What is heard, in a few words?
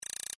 robots picking